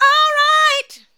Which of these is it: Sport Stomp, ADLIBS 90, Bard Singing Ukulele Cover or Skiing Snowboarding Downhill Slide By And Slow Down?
ADLIBS 90